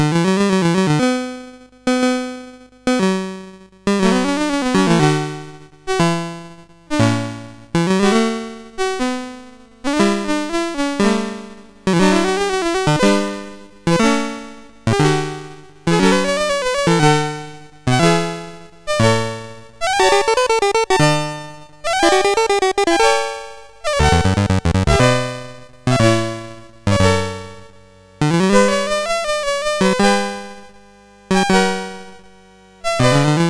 Fugue No 2, MOS6581R2

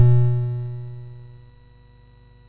Frantic, MOS6581R4

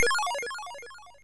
Fuzzball, MOS6581R3

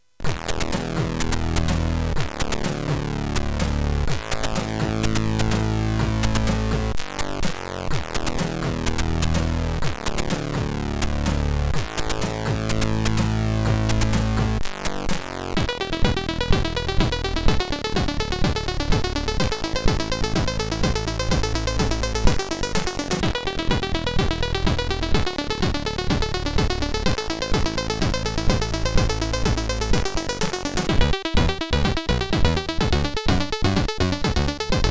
Drone, CSG8580R5

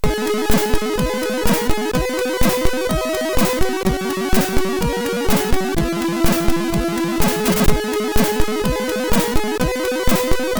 music, sid, MOS6581R3; No Limit 21

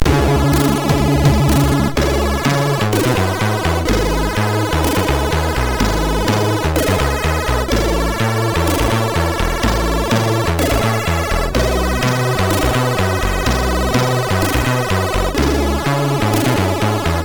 Hawkeye loader, MOS6581R4; sid

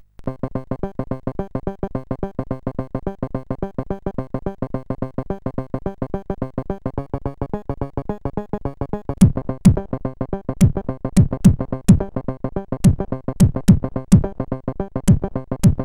Crunch Funk, CSG8580R5; Akira K

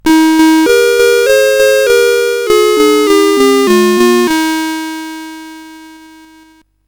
sid CSG8580R5, GAMES; Alice In Wonderland

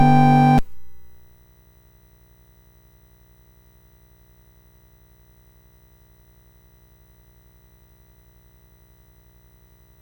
BASIC sid MOS6581R3, GAMES; Train Dispatcher

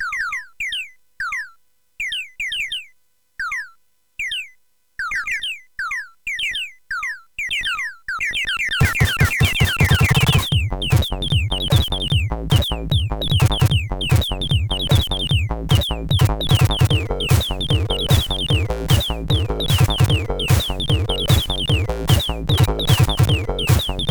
Bird Song sid 8580R5; hvsc

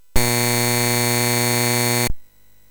Final Synth Sample, MOS6581R3; hvsc

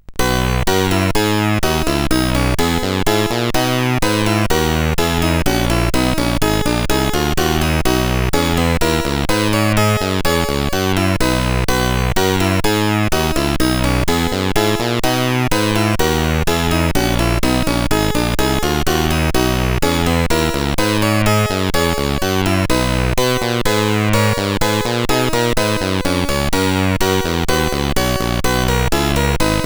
Bach CSG8580R5

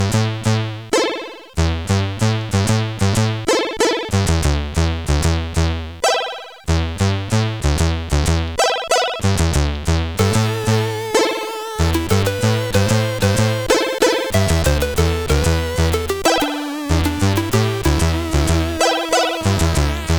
Lazy Tune sid CSG8580R5

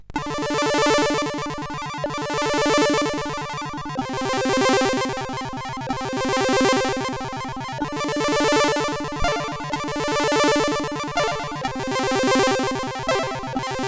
Intro Jam, CSG8580R5

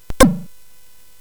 Tecnetium, CSG8580R5; hvsc

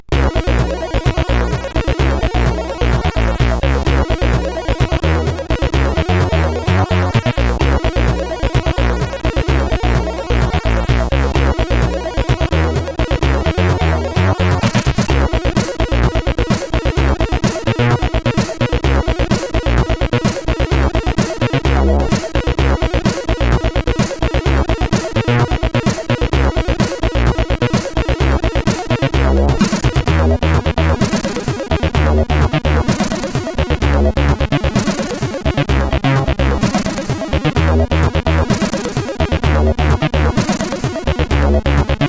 funk, CSG8580R5

